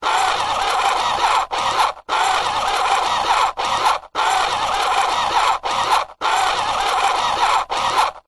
science fiction robot small tracks wheels motor whirr short bursts walking